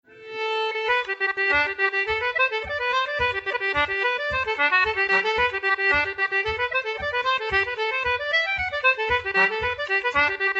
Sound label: reels, concertina